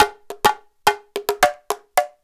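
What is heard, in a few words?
emelako grooves; Best Service; World Colours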